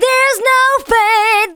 VOCALS SUNG; Techno Trance Essentials

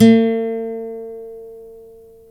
GTR NYLON, Nylon Chorus; Roland LCDP02 Guitar and Bass; Nylon String

